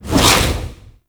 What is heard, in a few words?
Reaper Double Jump; Warframe; Wraith